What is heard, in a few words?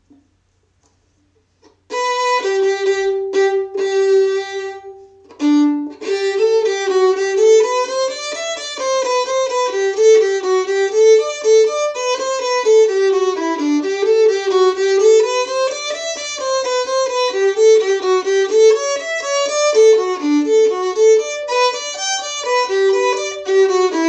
Hunters Harmony